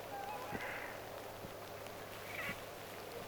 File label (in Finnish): onko siina haapana ja tavi